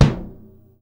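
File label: Jazz kit bass drum, Drums Acoustic; AKAI; 90s sample CD; USB Soundscan vol.10; JAZZKIT 3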